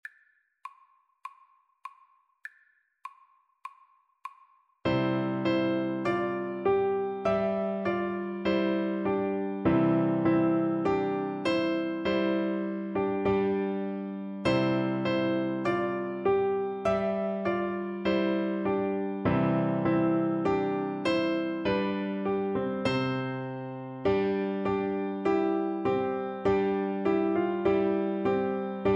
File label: Oboe